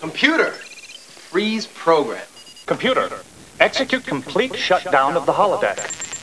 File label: winshutdown